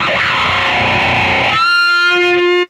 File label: squealing guitar, Stabs